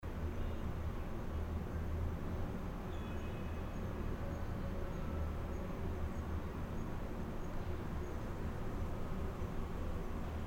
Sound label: Turtle Hill Bunker Inside